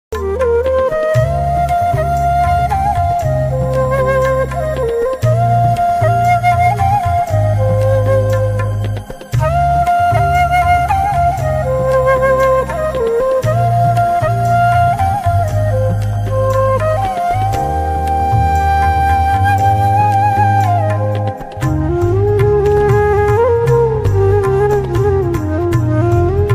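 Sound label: song flute cover bgm ringtone; heart touch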